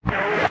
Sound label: arm retract, asteroid collector; Factorio